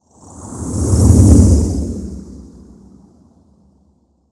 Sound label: Spaceship Pass By, Small, Ship, Science Fiction